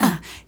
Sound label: ah sexy; ooh ahh